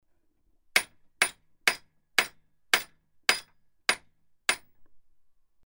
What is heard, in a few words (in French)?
son isole marteau